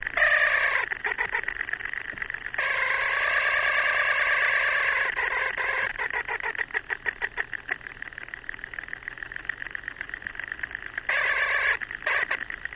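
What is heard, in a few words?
Motor Small Scream, variable speed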